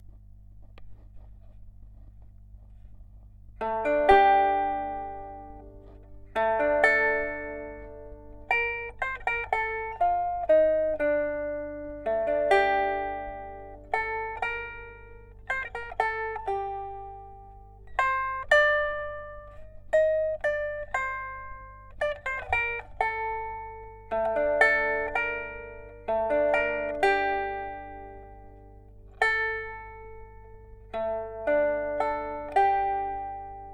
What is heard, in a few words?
Lute Guitar Sample